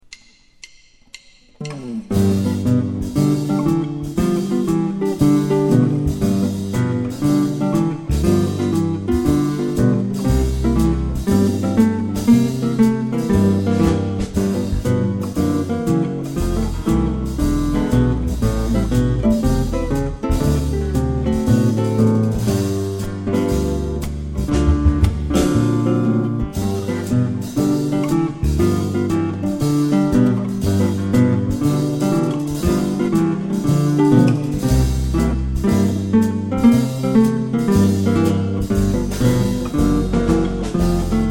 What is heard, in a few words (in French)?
walking basse